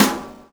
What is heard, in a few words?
Circuit Snare; Sonic Foundry (Sony Creative Software); Drums & Drones; Construction Kits